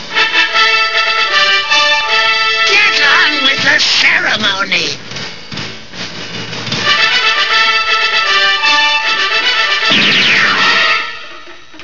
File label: trumpets